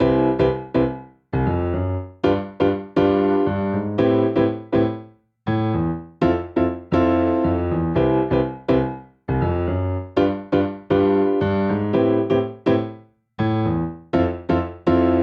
Progression Upbeat I - V - vi - IV C Major 121 bpm, Grand Piano; MIDI Clips; Ableton; Factory Packs